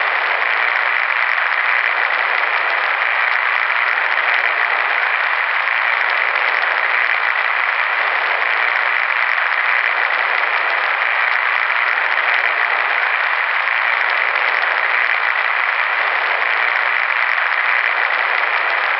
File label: white noise heavy long, radio; STALKER; IWP